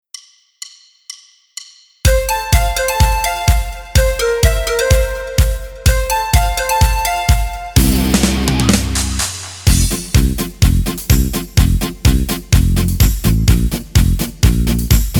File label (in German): GCFB ohne Harmonika ohne Chor